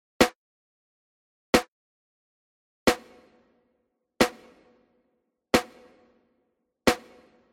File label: Lexicon PCM Native reverb, med vocal chamber